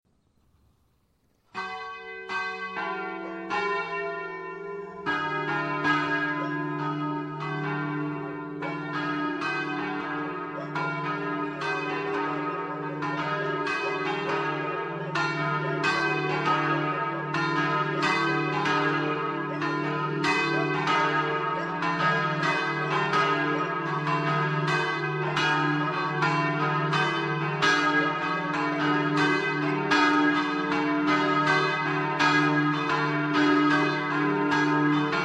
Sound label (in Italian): campane; DIERICO